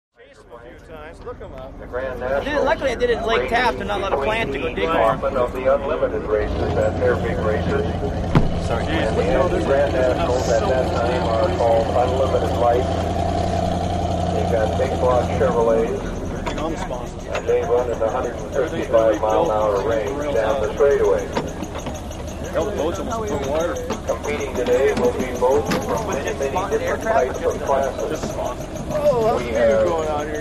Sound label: Boat Race Pit Doc Amb, motorboats